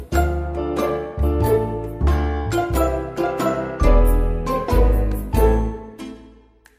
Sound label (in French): Virgule musicale; nouveau generique pour un peu de culture